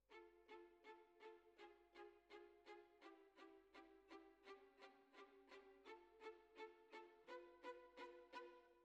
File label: Violins, jazz for piano